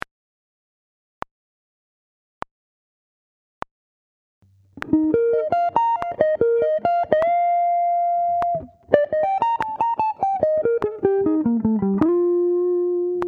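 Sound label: Sweep Picking, guitar; Sweep Picking Part 3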